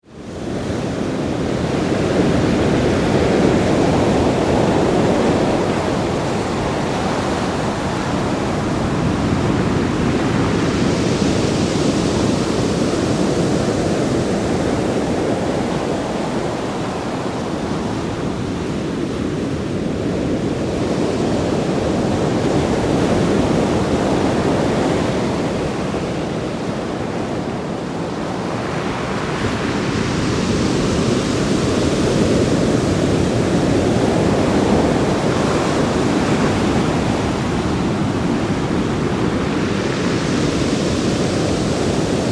Ocean Rough Sea, Waves breaking at sea - recorded from beach; good storm roar; Nature; Tom Hutchings